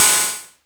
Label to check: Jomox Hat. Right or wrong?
Right